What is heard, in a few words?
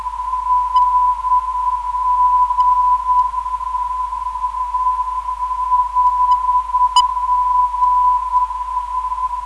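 309kHz, non-directional beacon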